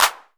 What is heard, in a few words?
ANA P CLAP, ANALOG KITS; 90 sSampleCDs; Crypton - DrumBase5000; ANALOG KITS2